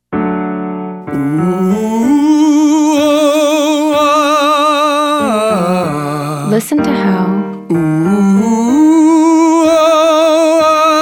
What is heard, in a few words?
Exercise ooo to ah male; Singing